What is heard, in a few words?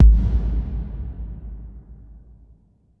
XXL K909, XXL HALL; Masterbits - TR-Minator